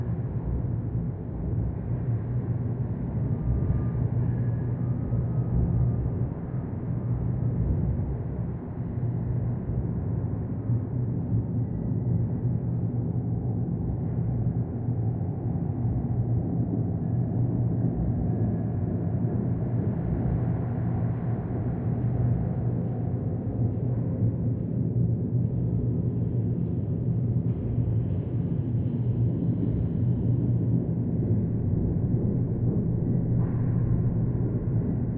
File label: Ambience